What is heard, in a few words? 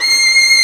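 Vio legato C6; Digital Sound Factory Virtuoso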